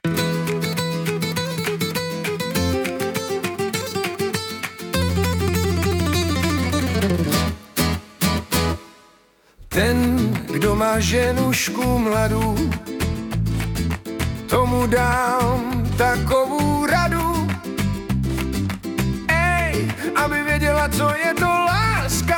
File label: comic flamenco folk grievance